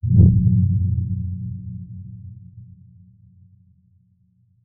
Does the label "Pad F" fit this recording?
No